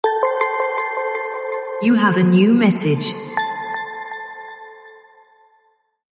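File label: good message tone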